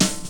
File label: Perfect Steel Snare Drum Sample F# Key, snare single hit